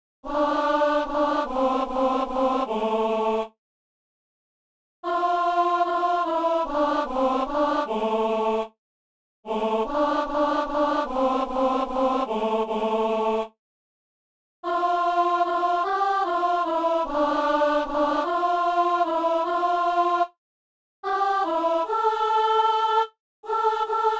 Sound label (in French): donne moi seulement de t aimer soprano; Saint-Denis